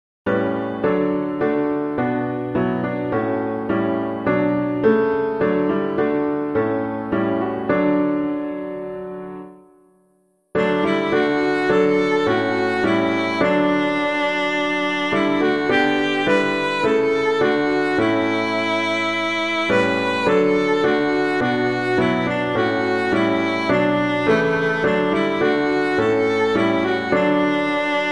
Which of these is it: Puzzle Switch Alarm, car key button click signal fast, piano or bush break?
piano